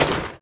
cold impact, spells

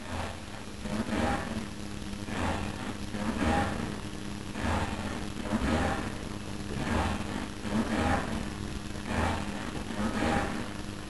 machine

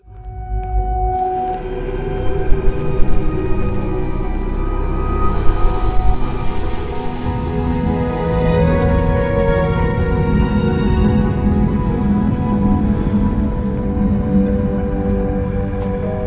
amb

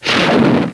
rocket, tank

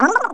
gurp; openffa; Lemming

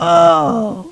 death

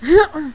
jump; baseq2

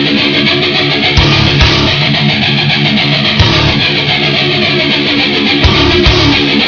downtown rock

